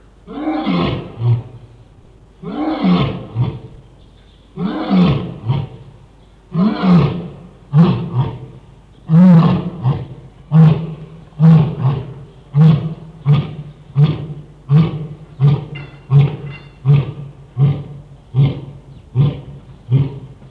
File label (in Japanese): lion unaru